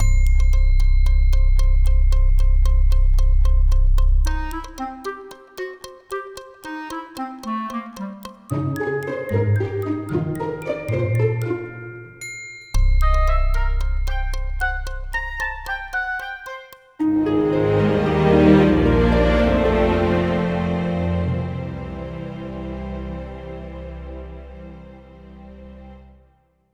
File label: Cute Robot Theme